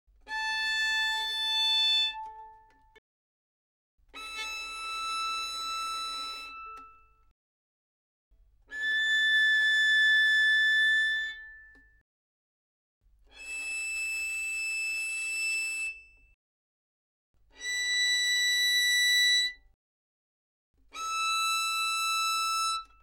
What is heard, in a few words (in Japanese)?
ヴァイオリン, 擦弦; 弦鳴楽器